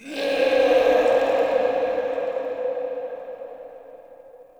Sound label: Interior Texture Aggression Calls; Ambience; Misc Indoor; DE; Warframe; Infested Eris